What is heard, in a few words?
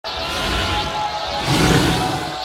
Tiger Stun